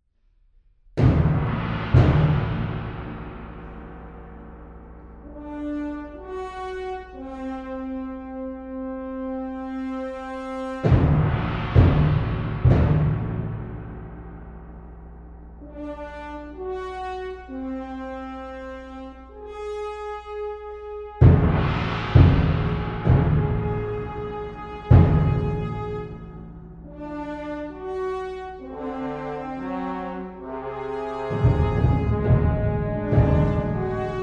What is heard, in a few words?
Fanfare